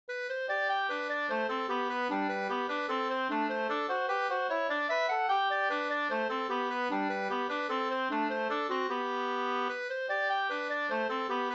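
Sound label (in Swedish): vals, two-part; Burgavalsen av Grodd-Jaken Go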